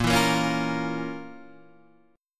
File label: F Major 7th Suspended 4th Sharp 5th